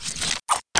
change spin; Soldat

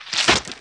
GUT SPLAT; Dark Omen

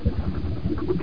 bubble; dosgames; roc

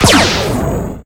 gun turret fire; darwinia demo

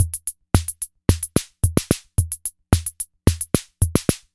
SR88 ROCK, Electro Crash

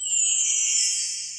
wand; XMSMAGIC

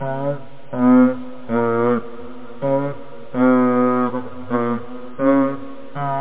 doop, loop; da capo vol1; instruments

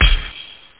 B DRUM; Groovy Bytes: Behind the Moon

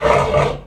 boar; kult

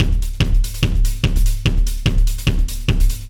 Bass Drum & Hats; ACID MUSIC; Drums

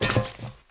jump metal dry; MOTS

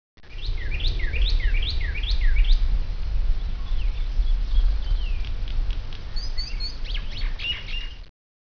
birds

